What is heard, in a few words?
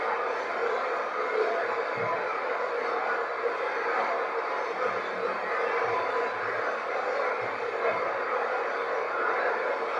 Barbershop, another bang; greene county historical society museum return investigation; Barbershop Spirit Box; Portal